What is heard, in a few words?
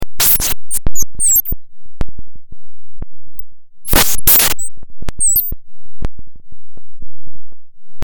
fm7 patches